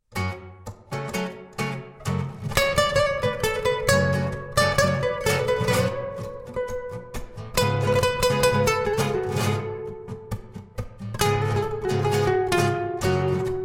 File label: Tango